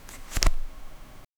quaderno viene sfogliato, paper

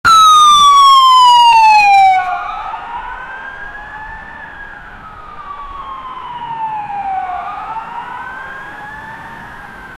suono di un auto della pol

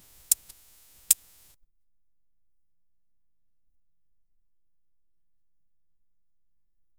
un cerchio che si chiude

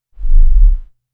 breve woosh di aria sotti, thin

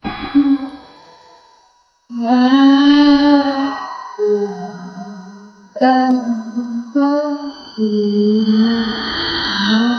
genera un suono di tensio, mental

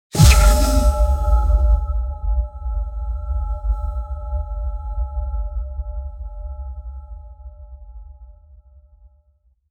trasformazione di una kit, energy explosion

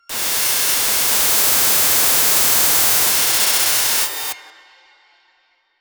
suoni cibernetici cyberpu; cyberpunk

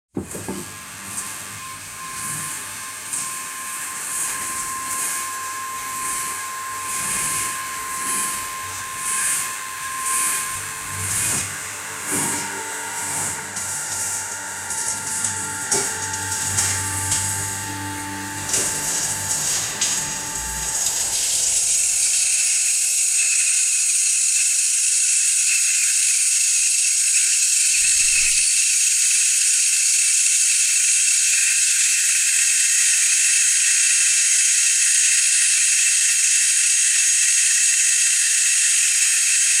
due persone lavorano in, laboratory

